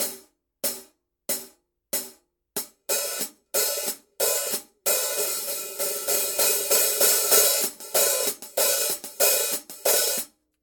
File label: brass 14 hi-hat pattern